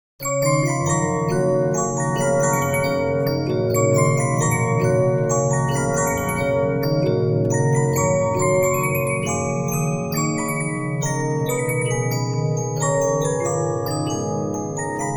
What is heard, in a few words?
Regina, 15inch disc musicbox